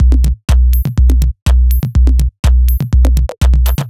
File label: B 123bpm, House Drumloops; Zero-G House Fabrik Drumloops